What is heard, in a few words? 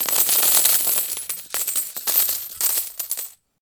Big Jackpot Coins